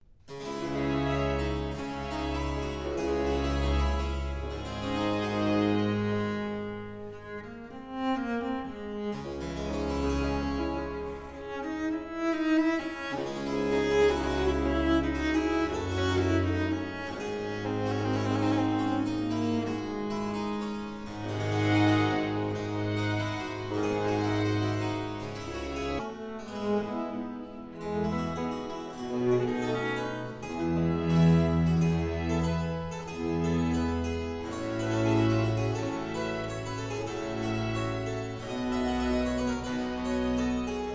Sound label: Concerto In B Flat Major For Cello; RV 423